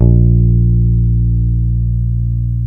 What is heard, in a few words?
BASS A#1, ELECTRICBASS; OLD